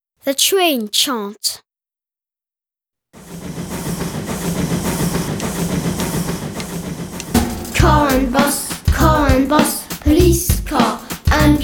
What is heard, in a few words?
train chant